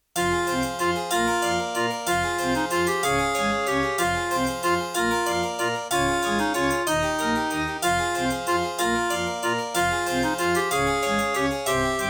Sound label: Serdaco Dreamblaster X16GS NATIVE; Death Gate